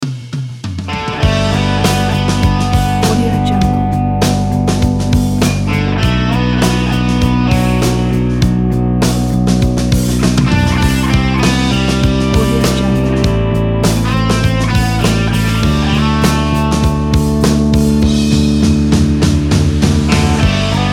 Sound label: classic rock music